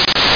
Chaff; EMP; Music

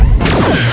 Photons; Amiga Games 1996 #7; WarStar

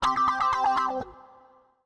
ELEC GUIT, House, Guitar; eJay

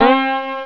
Sitar 2x LOW; Prince of Persia

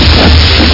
fuse; worms dc; arnie

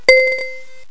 xphone

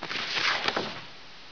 page flip

